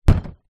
impacts plastic bin large; zapsplat

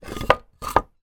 foley wood blocks small slide together; zapsplat

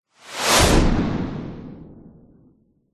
missile small launch designed

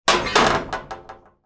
metal rod lean against metal wall; zapsplat

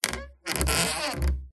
Stress Creak Squeak Groan; PMSFX

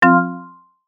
multimedia event tone

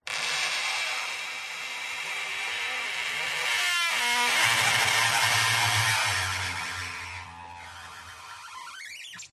Wood Stress Creak Squeaks; DPA4060; pmsfx

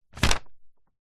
paper sheet a4 grab pick up; foley

